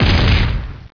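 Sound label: EXPLOSION SMALL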